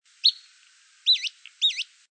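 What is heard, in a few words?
pip wheezee, Empidonax hammondii; PNW; Passeriformes; Tyrannidae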